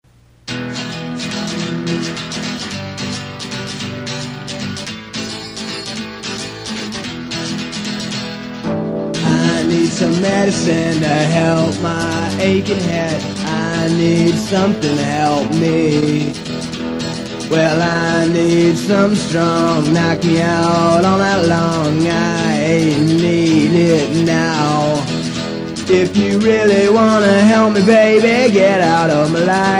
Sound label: Acoustic